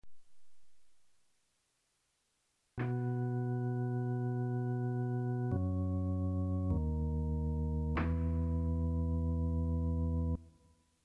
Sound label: organ intro